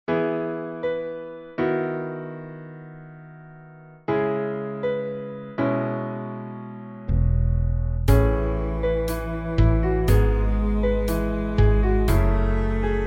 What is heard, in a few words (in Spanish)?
Se lo que es vivir. Pista instrumental sin melodia